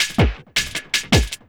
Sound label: Loop, Drum & Bass, 160 BPM